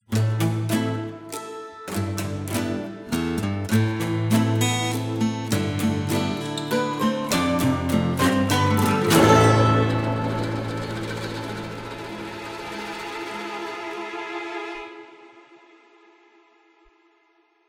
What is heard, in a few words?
Sword Warrior